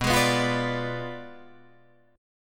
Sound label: B Diminished 7th B down Guitar Drop D